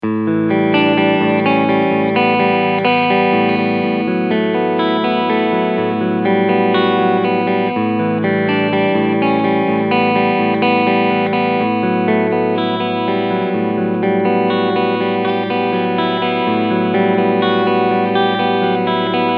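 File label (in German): Cleansound beide PUs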